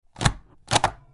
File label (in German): stempel